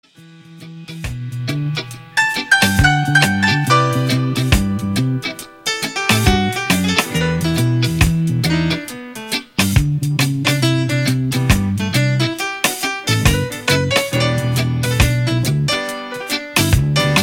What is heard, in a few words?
piano